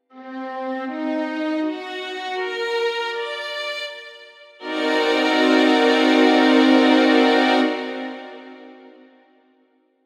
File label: chord st m7b5